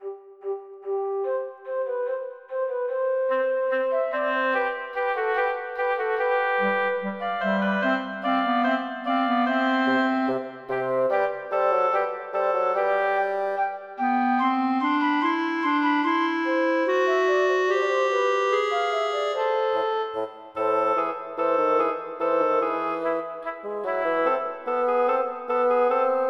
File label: fugue